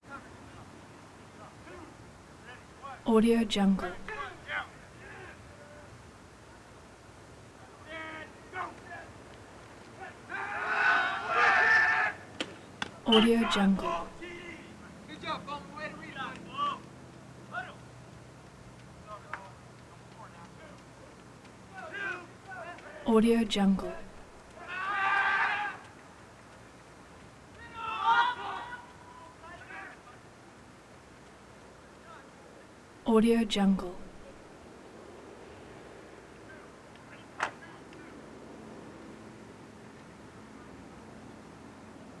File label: football scrimmage shouts male voices; prosoundfx